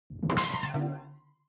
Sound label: sci-fi alien console hard; maschinen; signale; soundpool